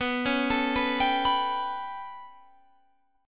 B 7th Suspended 2nd Sharp 5th down Guitar Standard, chord; B7sus2#5 arpeggiated; JGuitar